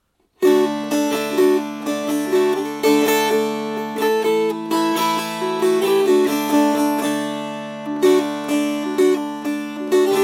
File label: Strummed